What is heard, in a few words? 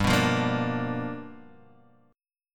G 7th Sharp 9th, Guitar Standard; G7#9 strummed